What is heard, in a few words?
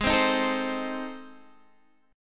A Minor Major 7th Flat 5th